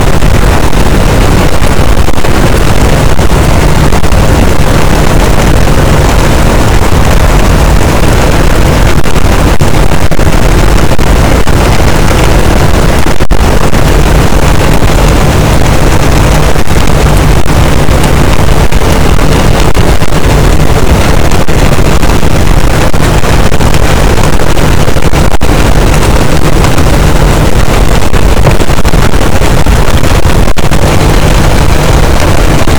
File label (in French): cachalot positif, boat, Physeter Macrocephalus